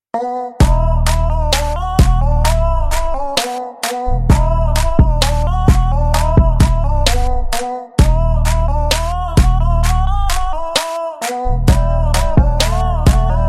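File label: background music, Telugu Ringtone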